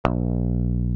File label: GTR E GTR, Clean E.Gtr; Roland L-CD701; Clean E.Gtr3; Velo Chord 3